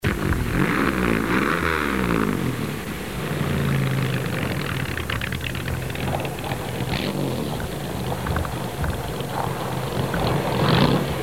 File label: engine noise